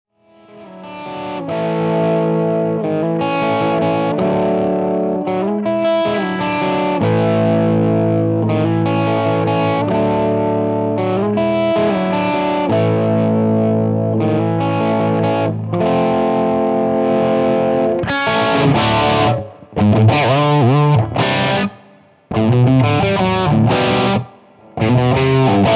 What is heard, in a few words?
DSL OT Crunch, Marshall; Marshall DSL Transformers